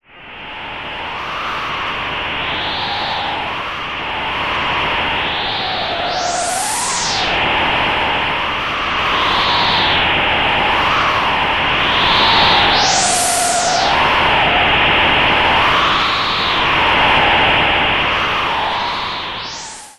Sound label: wind sound; final fantasy vi; ff3us